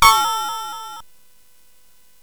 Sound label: Fast Sfx, CSG8580R5 sid